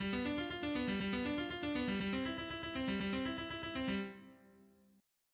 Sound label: arpeggio updown16; orpheus